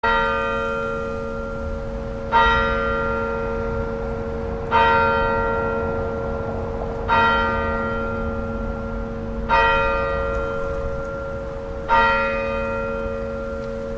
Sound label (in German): kirchenglocken